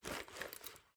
generic cloth movement, weapons handling

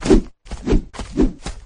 stand pistol; flood; elite

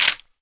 m3s90 bolt catch

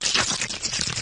move, flood infection

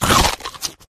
infector bite; flood

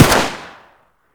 bizon fire; weapons

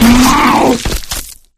flesh destroyed large; flood

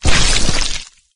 carrier walk; flood

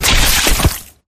flesh destroyed medium; flood

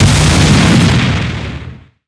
explosion

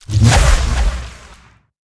dragon tail

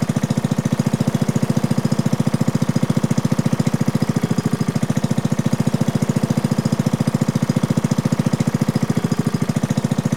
go slow down fast loop, gokart; vehicles; sligwolf

